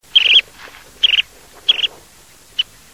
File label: Calidris ferruginea (song)